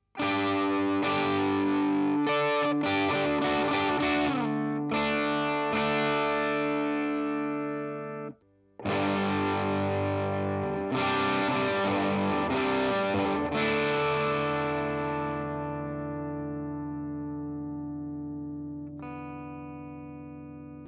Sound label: 1173 Mic Gain